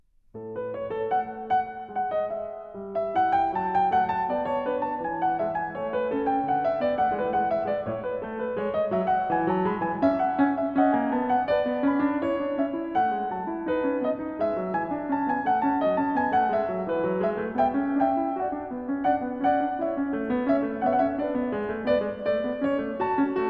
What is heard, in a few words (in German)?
Präludium A-Dur; BWV 864; J.S. Bach; Das Wohltemperierte Klavier Book 1